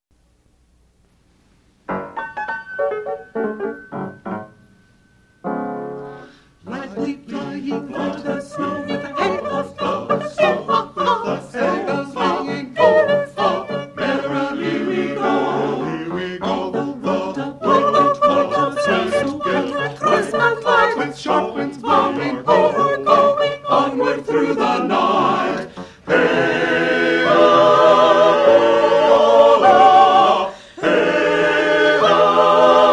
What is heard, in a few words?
The Sleigh Soprano, Choir